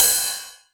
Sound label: 909 Ride, Drum Machines